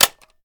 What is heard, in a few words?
ar reload fast mag in; weapons; gmod; viper; scharlie